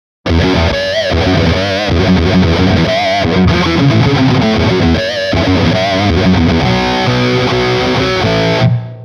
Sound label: Overdrive